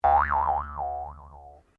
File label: JAW HARP LONG, simple cheap jaw harp; Miscellaneous Musical